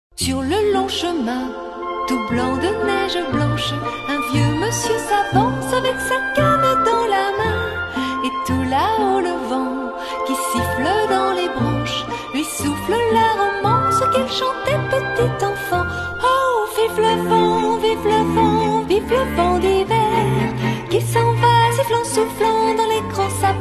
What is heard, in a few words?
Jingle Bells in French Christmas song for kids with lyrics; Vive le Vent